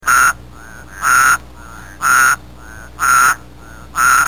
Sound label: spea intermontanus, frog toad calls; amphibians